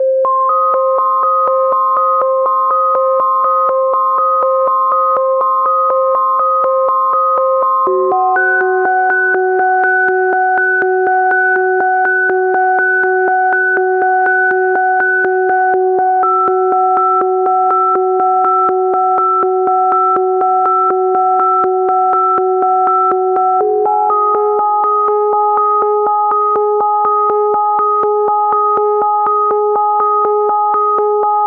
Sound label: 122 BPM Melodic Arps B maj G min Tonic E; MMB